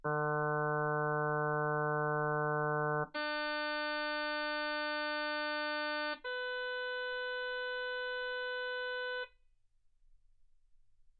harm sinus 48kHz

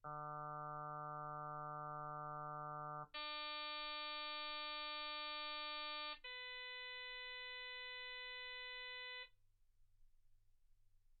harm sinus 48kHz

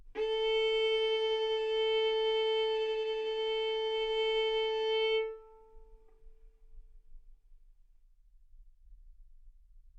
Violin arco A4 B4; mf; sul A; stereo

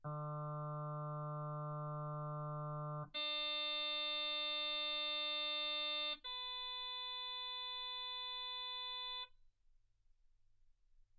harm sinus 48kHz

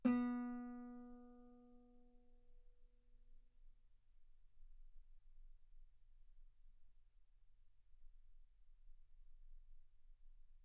Guitar ff sul B B3; single mic

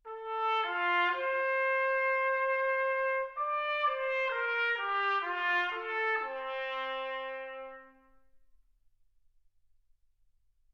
trumpet

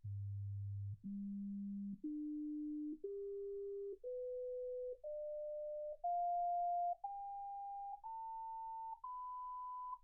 sinus tones 48kHz

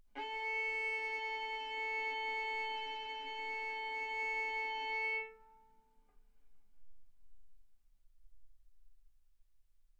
Violin arco mf sul A A4 B4 stereo

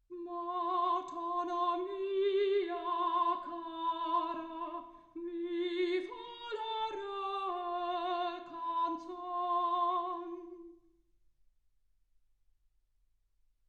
soprano

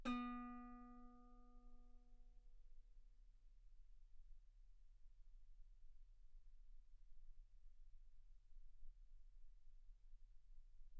Guitar ff B3; sul B

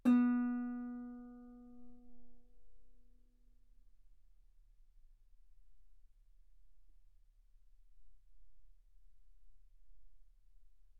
Guitar ff B3

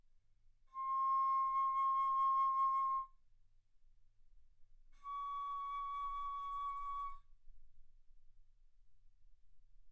Bass Flute ff C6 Db6

